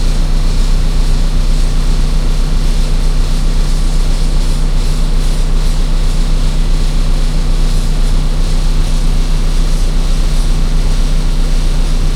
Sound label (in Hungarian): hatvanas busz utaster eneke leje